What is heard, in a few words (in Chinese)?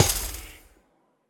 试炼刷怪笼破坏声